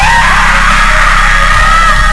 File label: scream; Elpizo